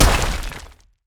anchorchain miss; nautilus

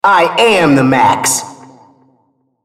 attack; RumbleSkin03

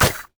crit attack; LoL; badrobotamumu

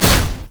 manta strike; LoL; fizz

